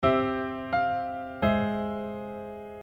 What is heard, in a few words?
diatonic